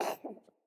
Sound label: Kitten Cough Hairball; Catbrow